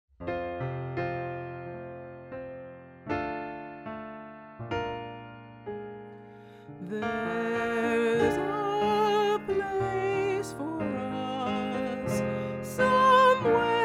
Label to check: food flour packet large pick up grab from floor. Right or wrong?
Wrong